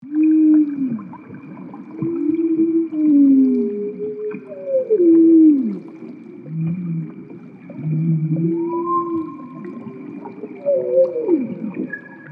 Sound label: Humpback Whales